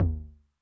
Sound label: Low Tune Long Decay, 909, MID TOM; classic machines; 909mod; MT - MID TOM